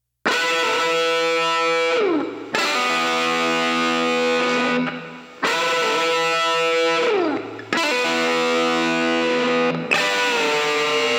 GTR LEAD

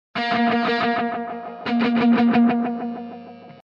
INTRO GUITAR ECHOES

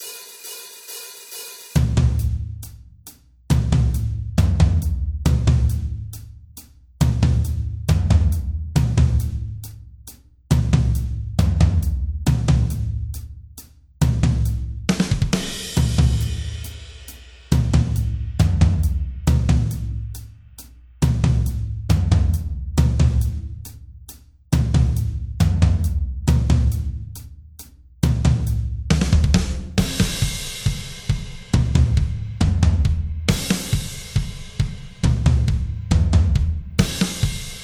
Drums

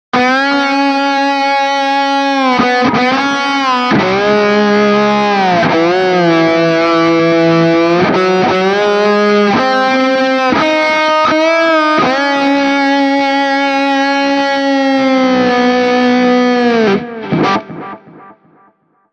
Intro Guitar Left

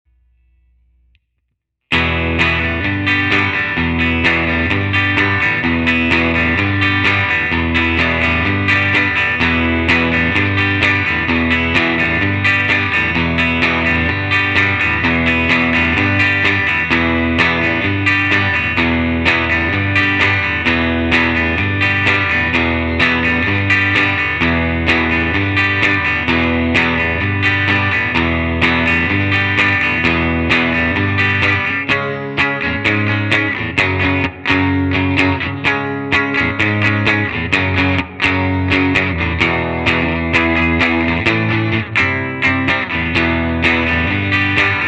Clean Guitar

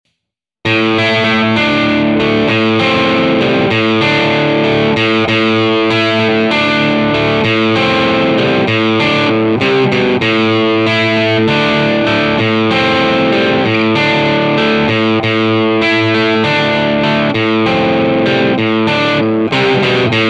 Intro Guitar Right

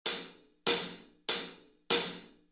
Drums

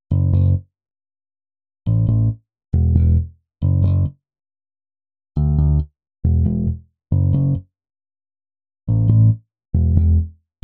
Bass

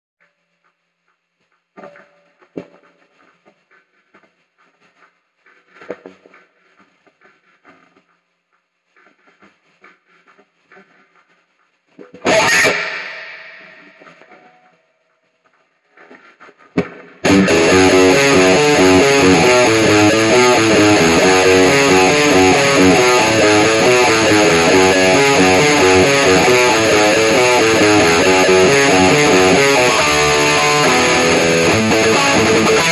Guitar Left

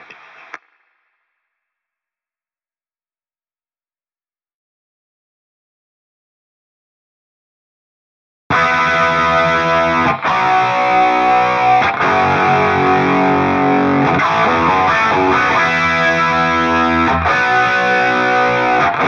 Guitar Intro Double

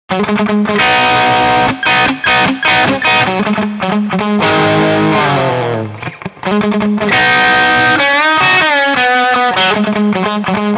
gtr half right

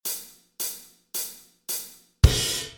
Drums; Mr. Tabs; Amplitube

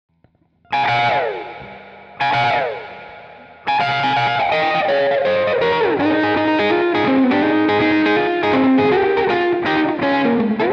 Melody Guitar; chord melody

